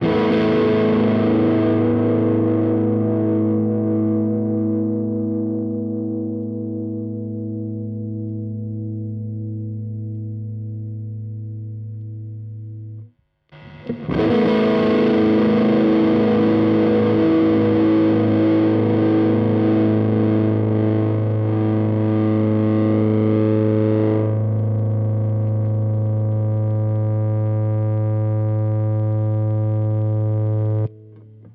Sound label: Fender Gain Sustain; Compadre